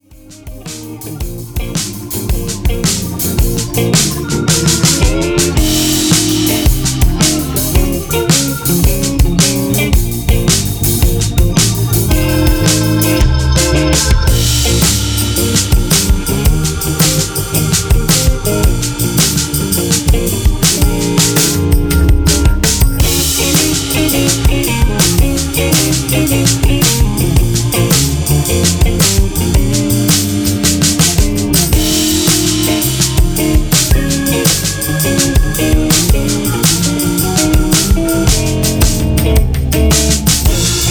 Chicago Blues in Bb

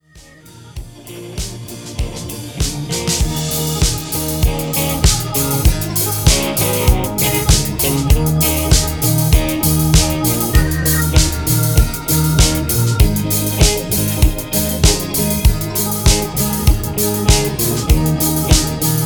Disco Blues in Eb

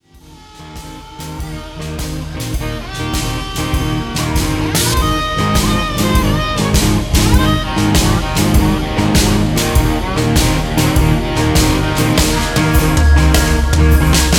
Classic Blues in Eb

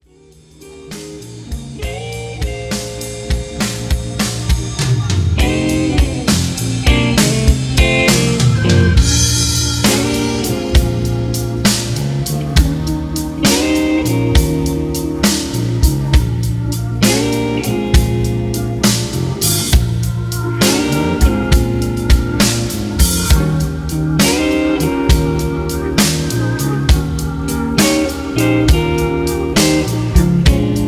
Super Laid Back in Bb

